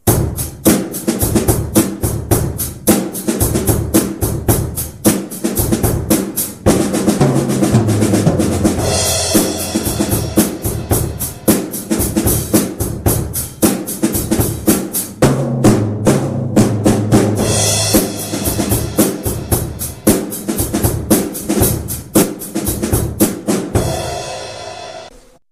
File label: drums